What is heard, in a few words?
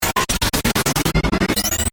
Power Up Chopper, Digital, Wide; Production, Element, Imaging, Accent, Transition; Tech